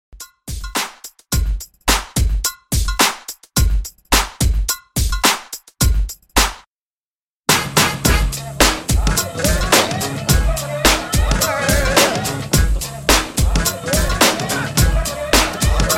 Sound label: New Jack Swing 80s Redrum 107Bpm